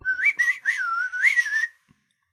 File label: Whistler bird